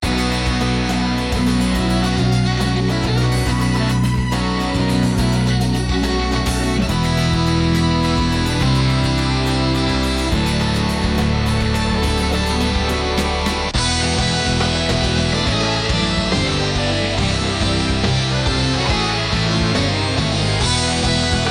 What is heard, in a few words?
rock music powerful